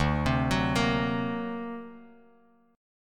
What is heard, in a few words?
D Augmented 7th Bass Standard, chord; 10,15,16,15; arpeggiated; JGuitar